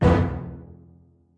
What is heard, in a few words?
orchestra hit melody kit; PADS and STRINGS